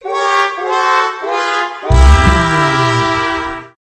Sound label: wa wa wa waaaaaaa